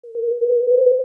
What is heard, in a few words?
wind ghost move